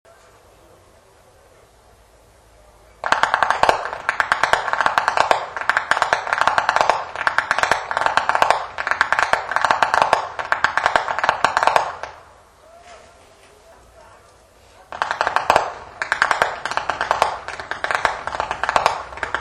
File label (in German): schnalzen, flachgau; steindorf